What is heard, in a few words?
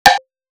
Snares, Alesis Performance Pad